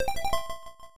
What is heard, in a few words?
Take A Break (Pause); Relevos Snowboarding; MSX